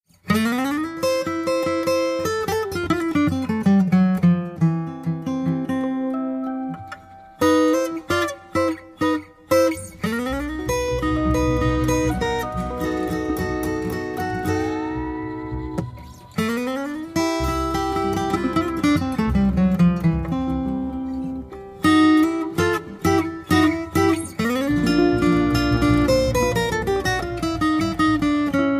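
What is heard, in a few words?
Whispering, Resonator Guitarist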